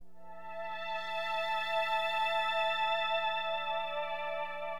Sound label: PAD G1; Acid Jazz Funk; Acidpads